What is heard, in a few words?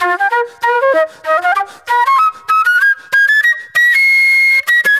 NY 70's Jazz Flute